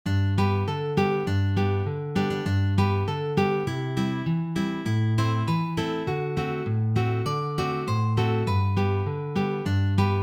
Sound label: Jingle bells; Weihnacht